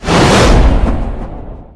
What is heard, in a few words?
whoosh transform; gwyn